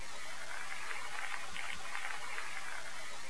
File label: climb rope